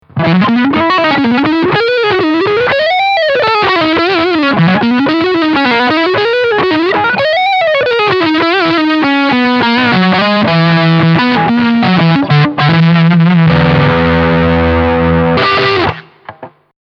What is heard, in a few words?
Fender Strat; test fender ltd am sp 70 hardtail strat bk